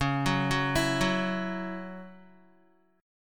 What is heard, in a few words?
Db Minor Double Flat 5th Db Guitar